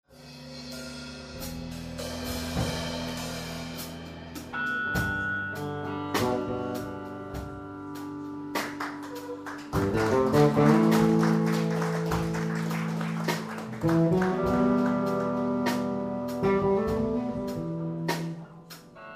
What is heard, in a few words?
Bass Solo